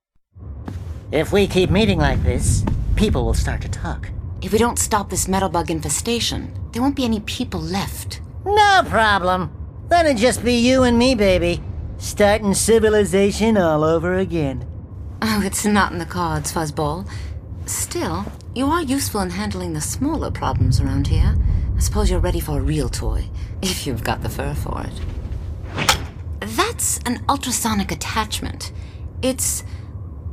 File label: Sprayer upgrade (cinematic)